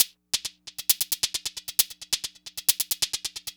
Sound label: Hi-Hat Esoterica, Beats; Sonic Foundry (Sony Creative Software)